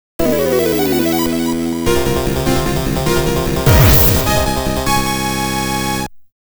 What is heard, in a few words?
Start